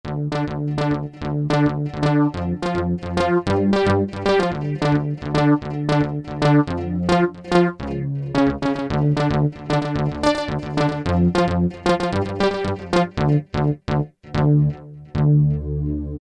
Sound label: Anabass, Kurzweil K2000, BASS; Kurzweil K2000 Contemporary ROM; Contemporary ROM Programs and Demos diskette